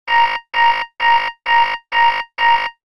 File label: Alarm High Alert, BlackBerry; OS-4.6